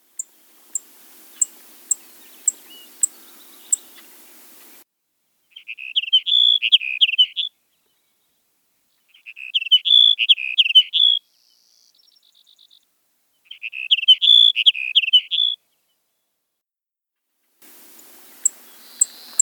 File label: Sage Sparrow